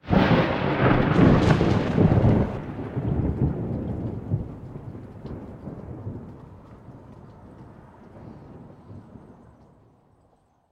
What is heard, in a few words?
Ambience Thunder Stinger; AvieBoss; Going Under